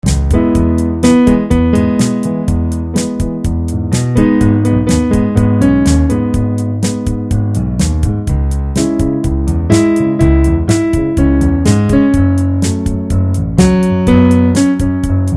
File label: piano E reggae lay back